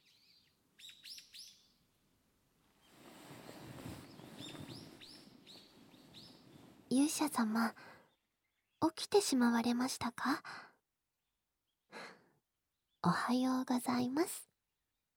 両耳舐め 手コキ, ASMR; ミライとリムのおはよう両耳舐め手コキ

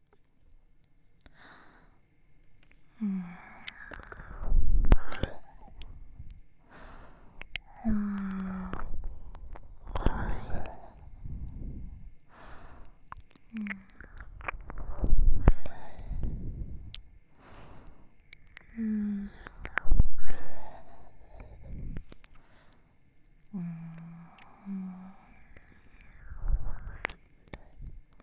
んべぇ 左

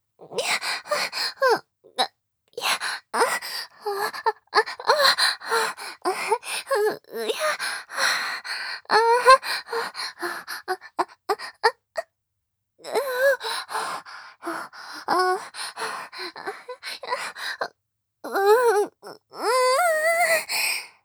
喘ぎ声 （正常位・激しめ）

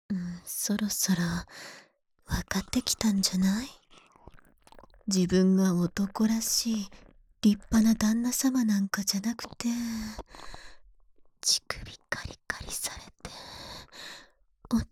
耳舐め, ASMR; 29分間耳舐めされながら隣人妻エステティシャンにキス・手コキ・アナル舐め・フェラ・騎乗位される音声

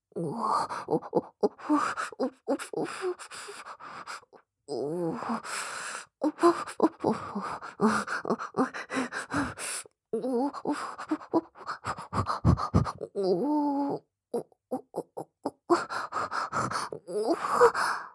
オホ声 左耳

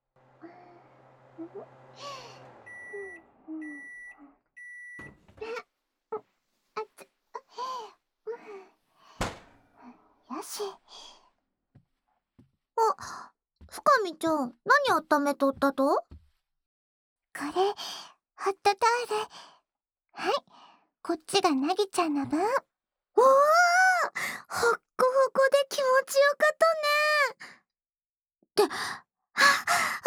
右と左を同時にこしゅこしゅ、ホットタオルでマッサージ; ふかみと凪の耳かきコンクール

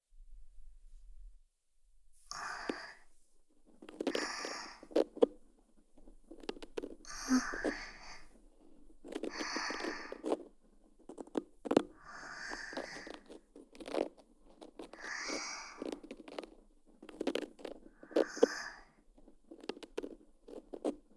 安眠用耳かきトラック; 04 安眠用耳かきトラック 体験版

